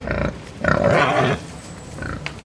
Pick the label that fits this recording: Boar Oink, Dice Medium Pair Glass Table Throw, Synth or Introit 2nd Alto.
Boar Oink